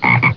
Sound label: frog; Game Maker4